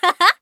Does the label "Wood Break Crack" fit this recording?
No